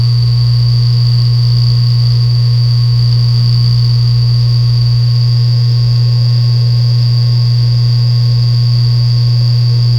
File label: eerie hearable and long